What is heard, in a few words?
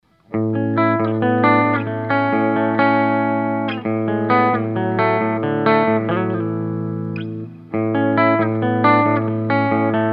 clean, humbuckers; pickups